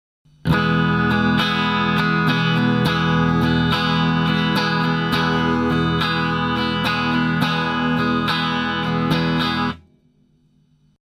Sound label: guitar